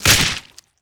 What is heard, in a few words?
blast; zoonomalypack; zoonamaly